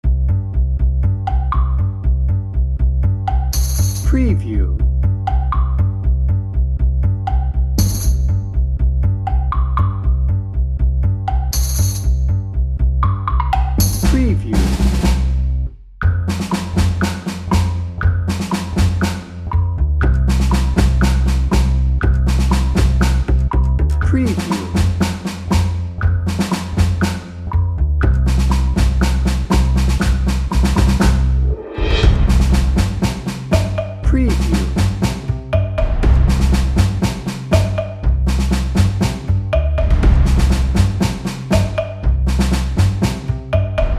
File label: children marching dnb